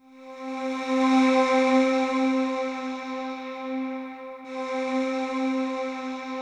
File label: sweep, synth line; 90 s Sample CDs; NiceBeats; SynthLine VOL-2